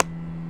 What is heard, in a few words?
Short Snap; fieldrecordings